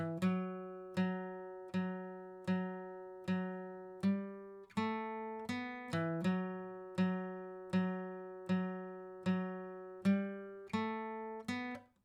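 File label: acoustic pattern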